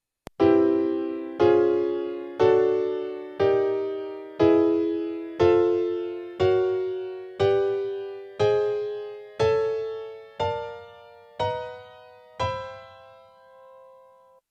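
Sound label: chord maj7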